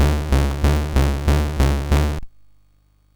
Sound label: sid MOS6581R3; Toobin